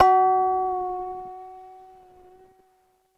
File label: ASI KOTO F#3, Yamaha VP-1, ASIA-KOTO; Music Store; 90's Sample CDs